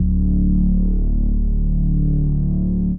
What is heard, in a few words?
Reese D; 99Sounds; Monosounds; Music Loops; Instrument Oneshots